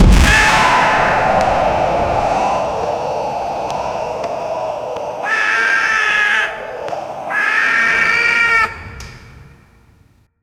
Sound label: massive army